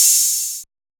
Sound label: OH, Open Hats